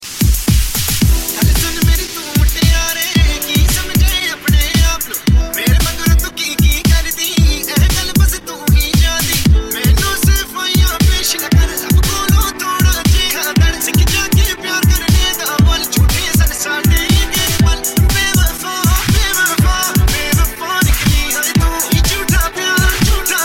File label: Hiphop Mix